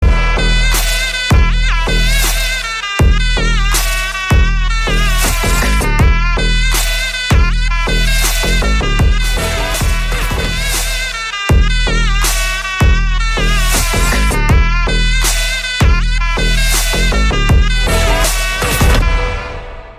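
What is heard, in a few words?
Glimpse background music Ringtone; Naga Chaitanya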